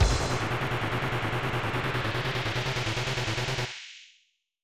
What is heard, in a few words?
Explosion; Honke Sankyo Fever Jikki Simulation; SNES; Fever Wars I